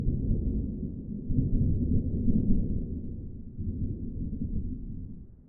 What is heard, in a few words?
Weather, Thunder, Tropical, Distant Thunder, Cracking Thunder